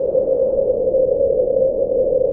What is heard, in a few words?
WINTER WIND; Pro-Rec - Synthsonic; JV FILM